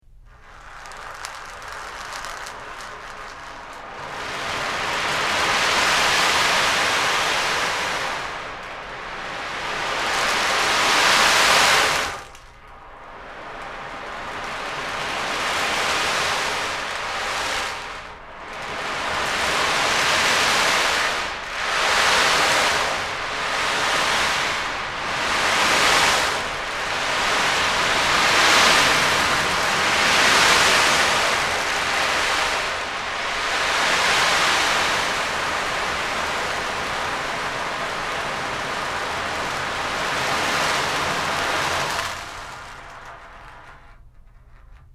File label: Rain